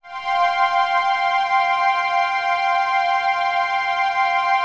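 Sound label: chord pad, Pads; Best Service; XX Large - Pads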